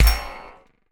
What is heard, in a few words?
Sphere Take; Game rip; MiSide